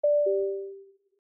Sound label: bip bop